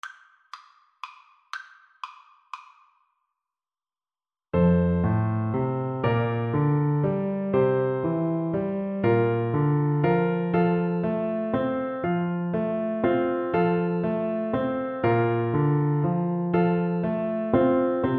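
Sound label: recorder karaoke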